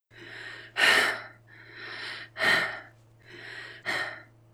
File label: Female Breathing; Phasmophobia